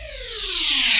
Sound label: CHARGE Servo 24 Semi Down stereo; Blobby